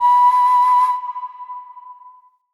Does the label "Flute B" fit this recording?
Yes